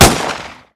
makarov; garrysmod

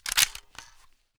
mac11 bolt back; weapons; garrysmod

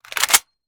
sako cock; sako92; garrysmod

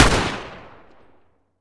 m16a2 fire, weapons; garrysmod

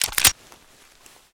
pp1901 draw; garrysmod

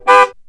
horn quick; garrysmod

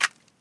ump45 deploy, weapons; garrysmod; ump45 cstm

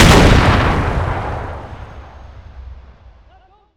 m67 explode; explosive; garrysmod

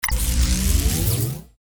cast; cstrike; war3source; manashield

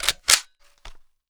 famas cock; garrysmod

